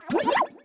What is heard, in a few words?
Bloop; lego my style preschool